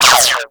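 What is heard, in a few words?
Gatling Gun Projectile By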